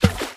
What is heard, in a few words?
bonus up; Geschicklichkeit; AquaBubble2